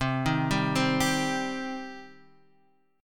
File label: C Minor C down Guitar Drop D